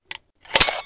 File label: crossbow clip out, weapons